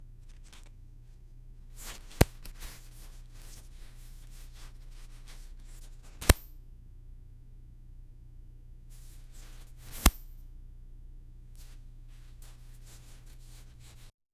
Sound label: the card flies out from, cards